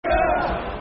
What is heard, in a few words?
Football Chants (Soccer Songs); Ohh To Be A Wiganer; Wigan Wafc; fanchants